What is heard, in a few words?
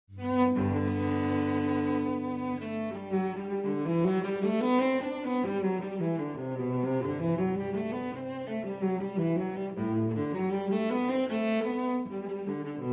Cello Suite in G Major Allemande; Cello Suite No. 1 in G Major, BWV 1007; II. Allemande